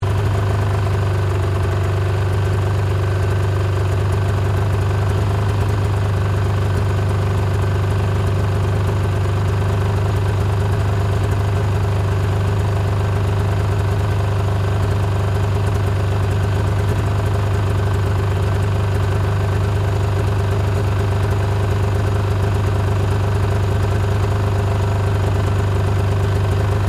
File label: Snowmobile Idle, Snowmobile, Engine, Idle, Loop, Yamaha, Exciter, 440; Sports; Miscellaneous Sport Sound Effects